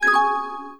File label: player connected; PokerTH